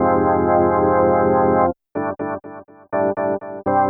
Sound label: Organ, Trip-Hop Loops; Sonic Foundry; Rondo Brothers Trip-Hop Loops & Samples